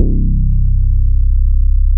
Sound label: DARK SOFT C1, ANALOG BASS; Music Store - Claudius Diemeter Schwerlos